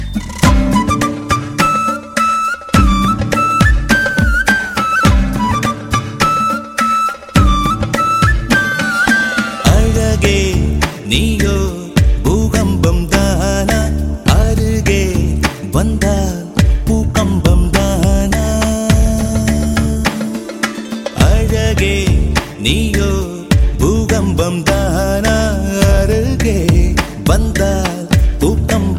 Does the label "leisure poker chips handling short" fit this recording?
No